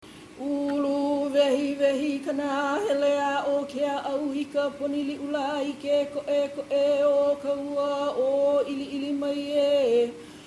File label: oli student; morning oli